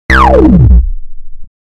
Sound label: rez bass